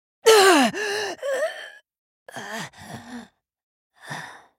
dying; Shyvana

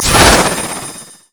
teleport; LoL; diana skin02

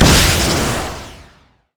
rocket grab; LoL; blitzcrank skin08

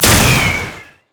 glacial spike; LoL; items

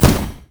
displacement flash; LoL; leblanc

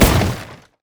frost flamebreath; LoL; shyvana

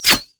thorn plant; Zyra; LoL

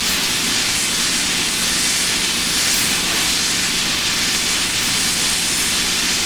nexus powerup electricity; LoL

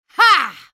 spell cast effort, en US; Elise

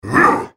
effort; Brand

